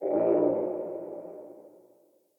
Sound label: Forest Open Glade Creature Far; Day